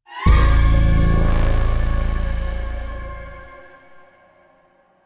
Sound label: Stinger Synth; mcsm music; General Stings